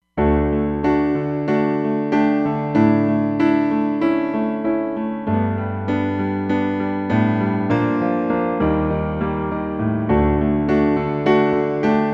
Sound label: Pure Grand